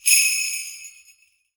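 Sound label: Sleigh Bell; Roland SPD-S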